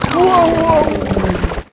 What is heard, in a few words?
Battle Copter Death